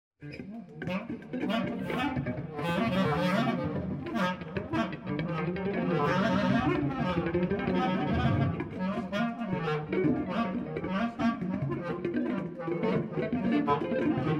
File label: clarinet guitar